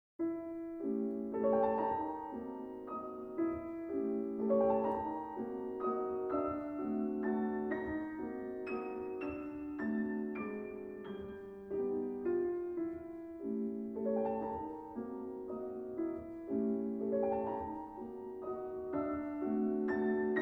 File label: Prelude in B minor, Piano